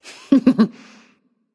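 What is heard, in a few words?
heh heh, female; smite; voicepack; hera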